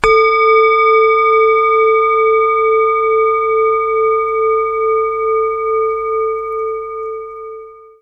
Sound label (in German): Klangschale Venus; Liefeld